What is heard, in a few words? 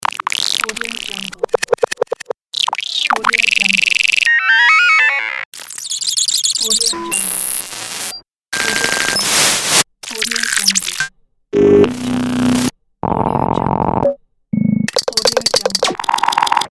glitch